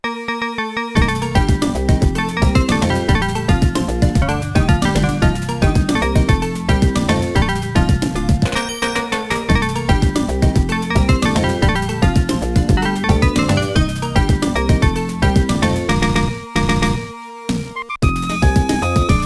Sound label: YM2612